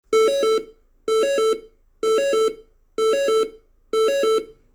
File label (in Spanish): tono